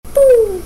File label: bwoom sound effect